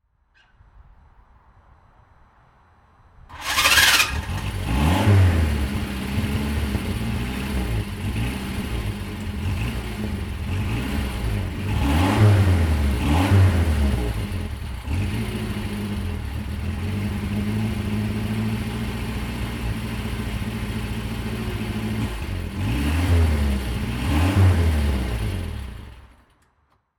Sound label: Fiat 500B; Fiat 500 'Topolino'